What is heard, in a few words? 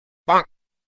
duck quack sound